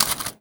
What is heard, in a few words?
Foley